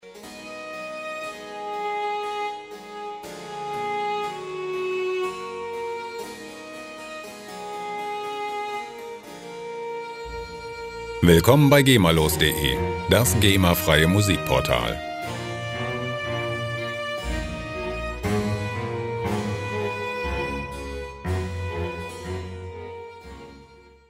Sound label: ballad